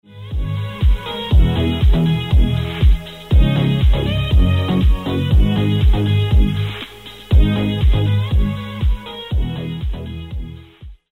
Musik Telefonfilter; analogen Telefon (Fe TAp 791-1)

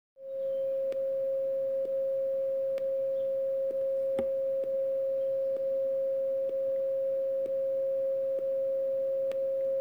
Esp test sinus

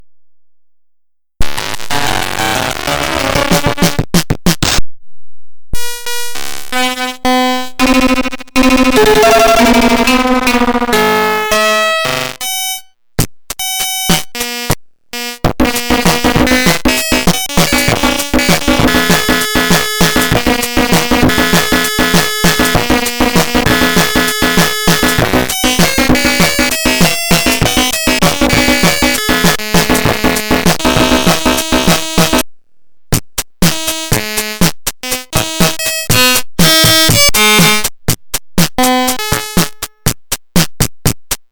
keyboard besser; Kinder-Keyboard

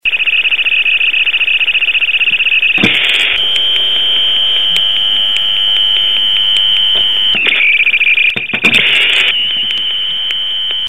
OralB Ladestation

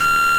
surren

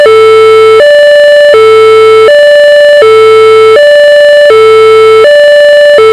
Martinshorn; Junior6502

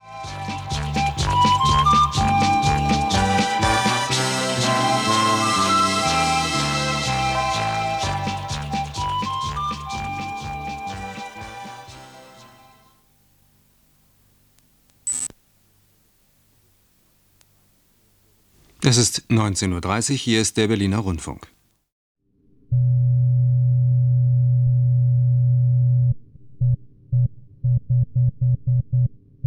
Berliner Rundfunk, In-band Schaltsignale im DDR-Rundfunk

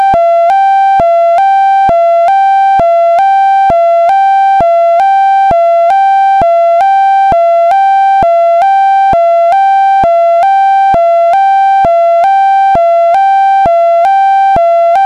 zweiklanghorn

